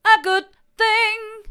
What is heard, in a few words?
a good thing hard, vocal